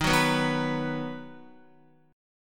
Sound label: Eb Minor Sharp 5th, Guitar, Ebm#5 chord; strummed; JGuitar